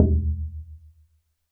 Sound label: Plywood Sheet Soft Strike